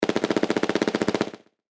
sfx auto gun; “Hyde” Foley & SFX; hyde ucsc hackathon game audio

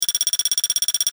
SFX Data Processing Downloading; “Hyde” Foley & SFX; hyde ucsc hackathon game audio

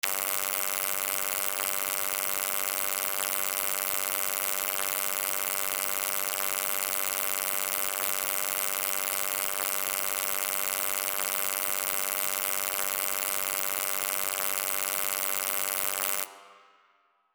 exposed wire, foley sfx; “Hyde” Foley & SFX; hyde ucsc hackathon game audio

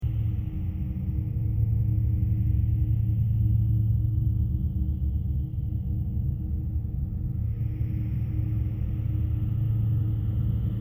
amb deep below ground; “Hyde” Foley & SFX; hyde ucsc hackathon game audio

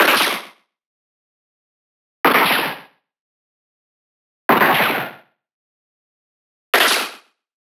SFX Gun Shots; “Hyde” Foley & SFX; hyde ucsc hackathon game audio

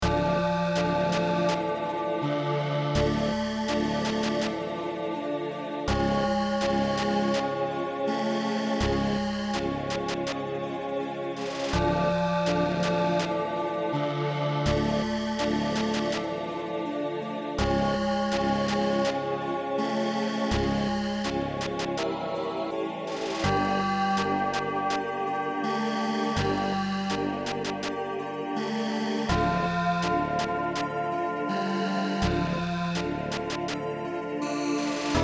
Final Boss Theme; hyde ucsc hackathon game audio; hyde songs

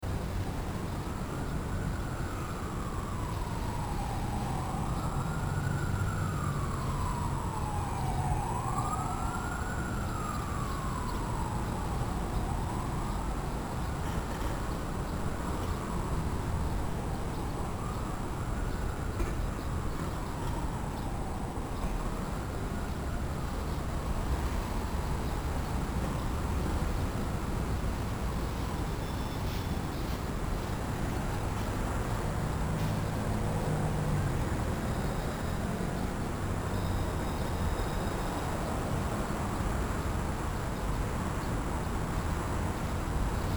amb city ambiance; “Hyde” Foley & SFX; hyde ucsc hackathon game audio

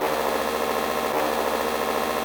SFX Heavy Machinery; SFX – Heavy Machinery 1; “Hyde” Foley & SFX; hyde ucsc hackathon game audio

